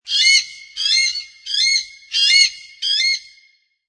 Weka calls, Exotic bird - New Zealand bird Weka, calling repeatedly.; Birds; Animals